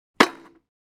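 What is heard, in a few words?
Skateboarding landing on a steel grind rail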